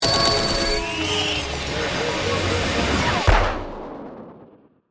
Stinger Burst; Production Element, Imaging Element, Accent, Transition; BlastWave

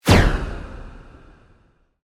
Sweep Organic Fast

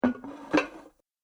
Heavy Pasta Bowls Placed And Slid Across Table; Household; Dishware; Andrew Fleming

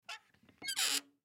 Wood Gate Open Creak; Gates; Doors; foley; Blastwave

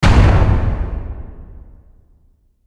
Hit Impact Reverb; Sound Design

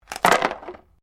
dice medium cardboard box throw; Variety of dice sounds; Casinos

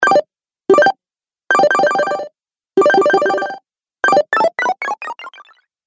Retro Videogame Fx 5 Sounds Pack

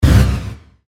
Laser Gun Fire, Shot; Cannon, Science, Fiction

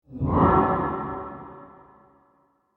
Whoosh Transition SciFi Materialize Tonal; Reverb Guitar; Whooshes; Sound Design

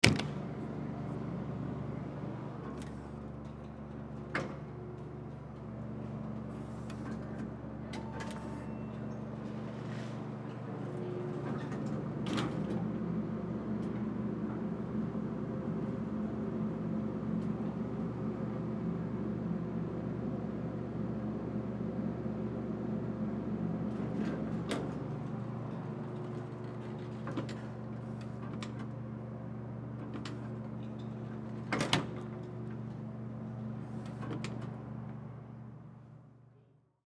Elevator Ride Push Button Move Up Interior; Industry

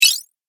Classic Spell, magic sound effects

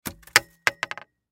Household Broom Drop Cement; Cleaning; Blastwave

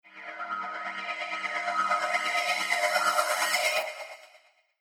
Reverse Cymbal Tremolo; Cymbal Sound Effects

